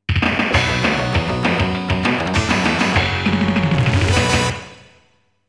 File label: ROUND CLEAR (Round Clear BGM); Great Magic Strategy; Mahou Daisakusen; Sorcerer Striker